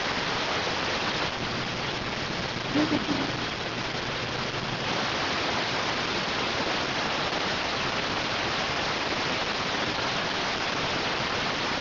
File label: rtty rx